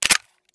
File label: clip out, weapons; cstrike; csgo